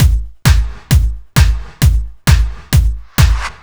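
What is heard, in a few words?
132 Bass Drum Snare, Drum Loops; Zero-G; Soundclash Nu Dance Electro; Acidized Wavs; 132bpm; Plastic Man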